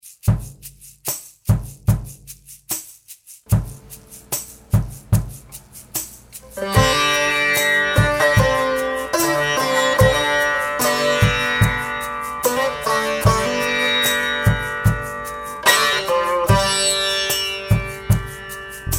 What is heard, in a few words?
closing credits; film